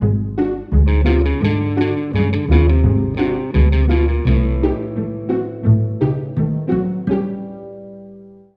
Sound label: Bari Sax; Simpsons Hit & Run; PS2